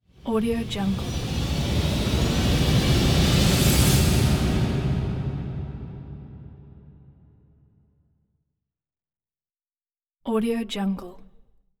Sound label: scary build up stinger; hollywoodedge